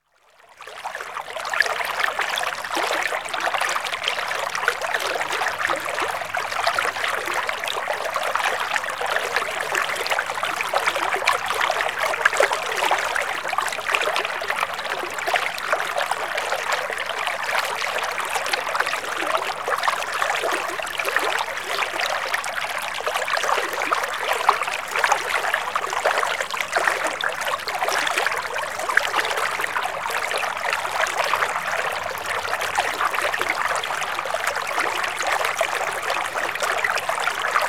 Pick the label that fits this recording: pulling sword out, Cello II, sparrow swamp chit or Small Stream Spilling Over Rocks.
Small Stream Spilling Over Rocks